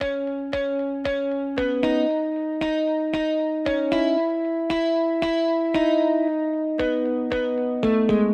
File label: Emaj 115BPM Lead; 99Sounds Music Loops; PANDORA BOX!; White Sand; Monosounds